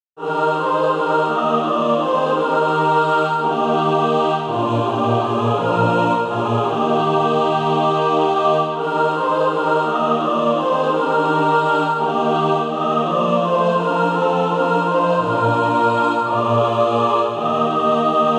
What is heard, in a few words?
mixed